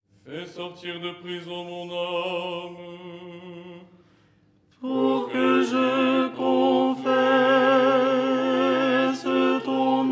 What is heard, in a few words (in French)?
tenor